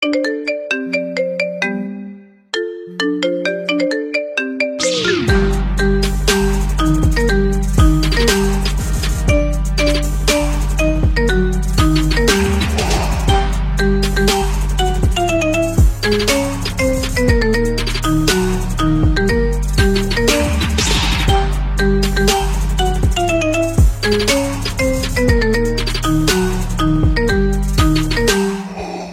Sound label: Theme Marimba Remix; Darth Vader